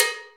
PRC COW, Cowbells; Roland - Rhythm Section; Latin 1

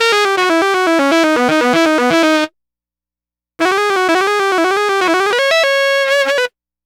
Sax Line; Dr. Fink's Funk Factory

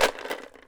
ELM BARK; AKAI S6000 CD-ROM - WorldSounds

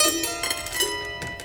Prepared Piano; Battery 3 Sample Kits

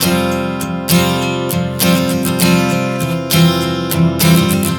Strum 100 F9, One Chord Strumming 100 bpm

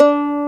SPAN B1, NYLON; AKAI S-Series CD-ROM Sound Library VOL-1

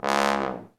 THE TROMBONE

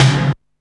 Snare, Alesis Hr16, Drums

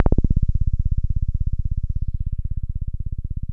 TB303 ACID; JV-1080

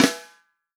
REAL DRUMS FUNK KIT

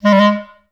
G2, Advanced Orchestra; Best Service

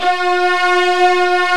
STRING ENSMB; Greytsounds VOL-1

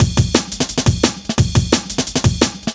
DNB BREAK

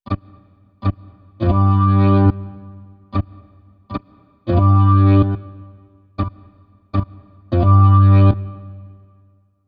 Organ, cinematic funk; Sonic Foundry (Sony Creative Software); Cinematic Funk Urban Tone Poems

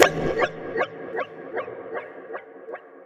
Processed Perc; Drums & Percussion; Processed Percussive; Sonic Foundry (Sony Creative Software); Electrocution; Beat Components

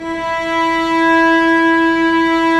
STR VC MF, Vcs mf Amb

